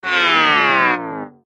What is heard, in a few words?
Death Voice; Maxwell